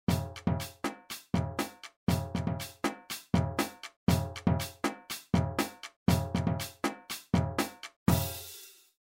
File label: Break Down, beats; DRUMLINE - SIDELINE BEATS; Sideline Beats - Book #3